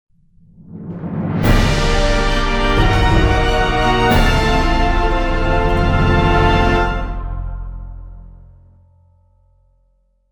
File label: Victory Jingle; pachislot